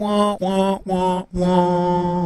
wah wah wah wahhhhh; Voice

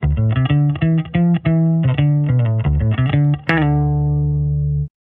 bass, Loops, Phonky

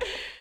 breath

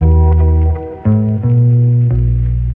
bass bouncey, Loops

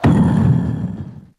beatbox explosion; Crash Bang Boom

